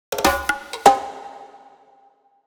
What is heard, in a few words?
Drum Fill 123bpm